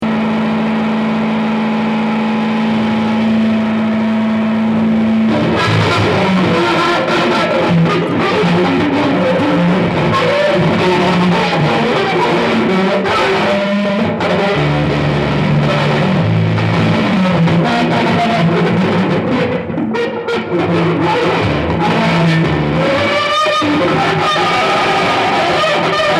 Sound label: bass solo